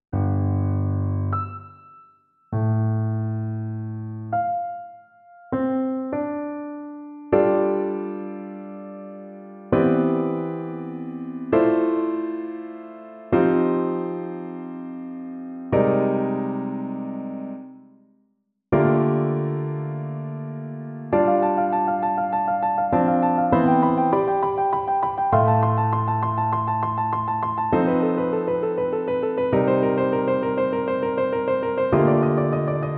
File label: piano